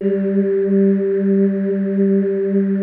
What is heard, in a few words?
BELLVOX G2, SYNTHVOICES; Music Store - Claudius Diemeter Schwerlos